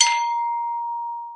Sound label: buy; Quentin C's Gameroom